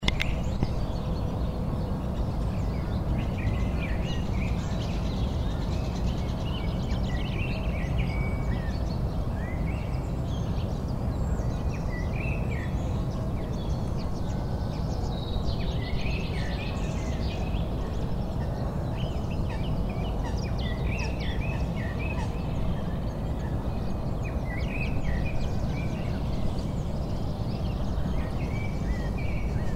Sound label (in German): seegeraeusche